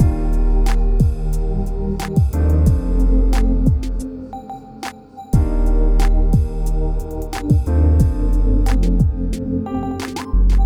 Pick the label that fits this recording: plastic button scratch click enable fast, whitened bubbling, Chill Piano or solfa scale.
Chill Piano